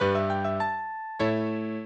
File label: minuet, mozart